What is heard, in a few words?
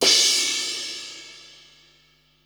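cymbal crash; 300 Drum Machines; Yamaha Motif ES-Series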